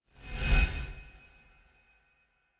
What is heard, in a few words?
whoosh Metal